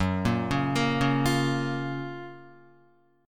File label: F sharp Major 7th, Guitar; F#M7 arpeggiated